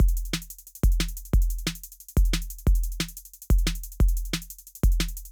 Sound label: Drum and Bass 180 BPM